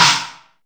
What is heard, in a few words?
SP1200, DRUMMACHINES